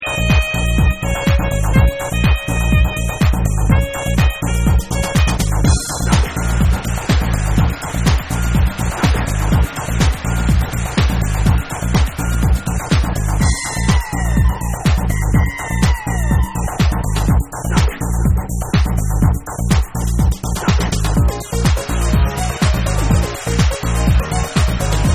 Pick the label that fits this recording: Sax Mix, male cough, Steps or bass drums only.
Sax Mix